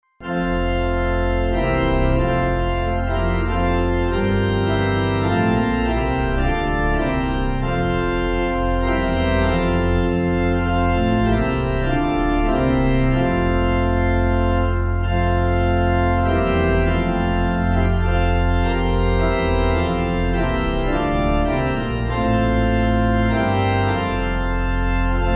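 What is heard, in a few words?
Pipe, hymn backing; Love Divine; Hyfrydol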